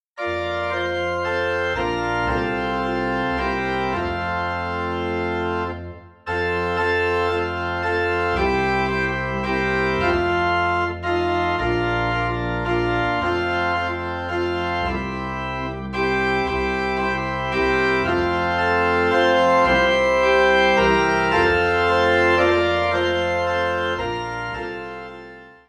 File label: Organ in F; Blest Be the Tie That Binds